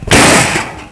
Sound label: SWOOSH; Mr. Cat's Quest 3